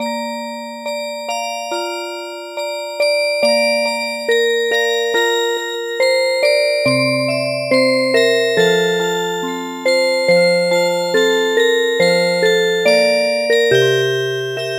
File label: Music Box, traditional; Du gamla du fria